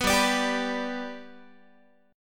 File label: G Major G down Guitar 4A 4E 5A 5D 5G sharp 6C sharp; strummed